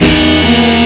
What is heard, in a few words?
WRONG